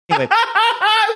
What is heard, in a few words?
boisterous laugh